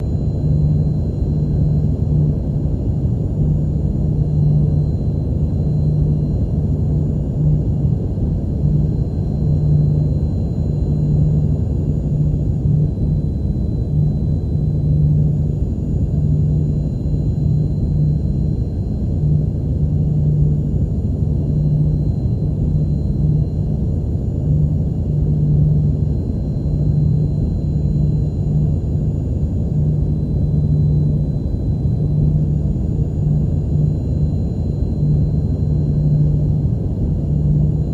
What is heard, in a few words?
Space Shuttle Interior Constant